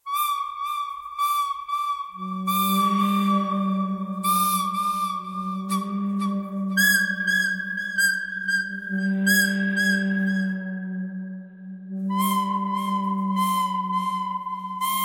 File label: bird canyon thread pentatonic